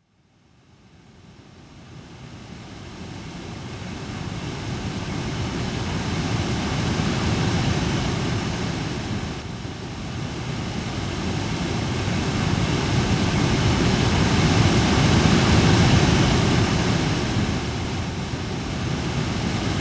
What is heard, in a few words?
White Noise For Baby Sleep Morning Waves